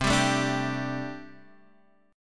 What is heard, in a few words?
C 6th Suspended 2nd C